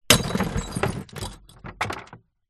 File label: impact glass bottle hit on concrete block in plastic bin smash break